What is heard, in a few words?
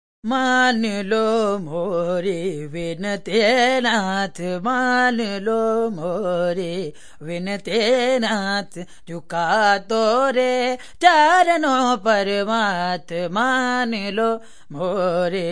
deep india, Indian Singing